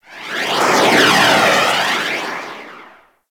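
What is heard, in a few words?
Mortar Projectile Incoming; Warframe; Venus; Venus Terra Mortar Moa; Abilities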